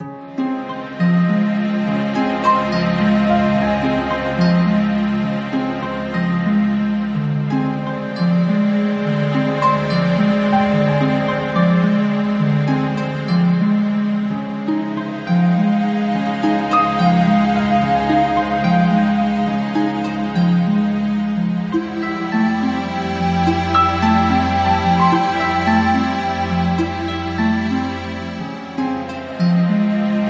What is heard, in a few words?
sanctuary loop